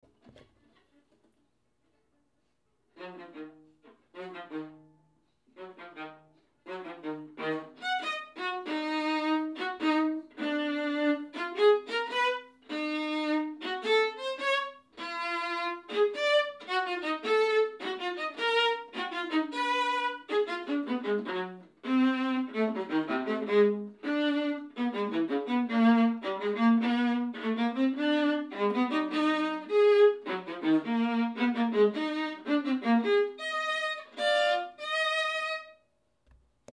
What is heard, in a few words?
Viola; Brahms; Kansas All-State Orchestra Excerpts